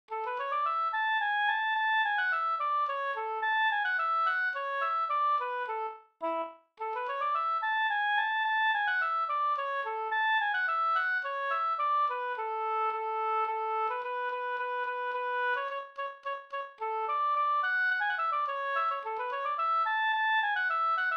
slangpolska

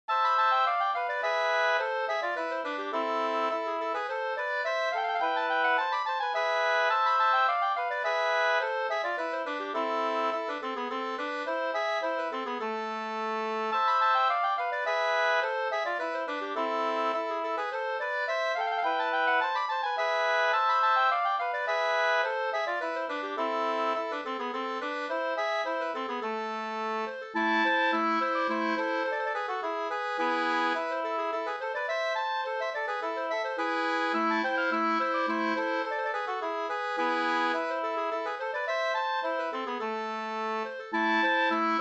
polska, 2st; Carl Hermansson

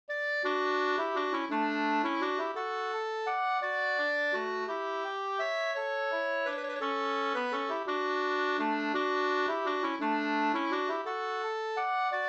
vals

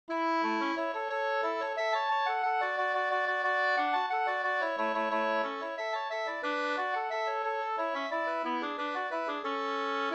vals

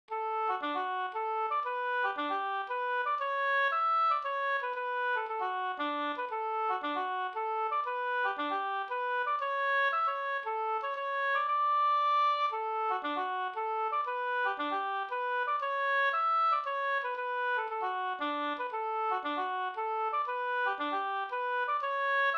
hamburska; Smedjebacken e Edvard Pettersson Sd

